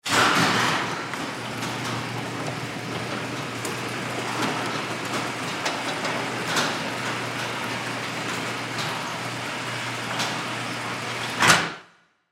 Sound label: Fire Station, Fire Station Garage Door Close; Emergency; Emergency Vehicles